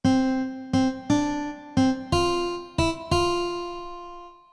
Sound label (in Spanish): guitarra